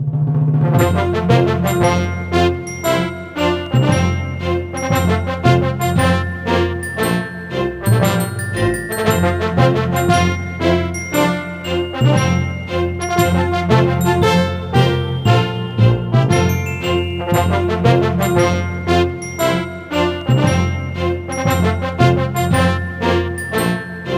Victory Theme; Knock 'em Downs World's Fair; NDS; Gluco Victory Theme

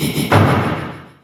Ninjastar death; Fight Knight

